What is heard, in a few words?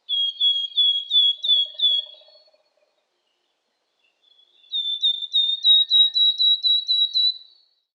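willow tit song, birds; kogara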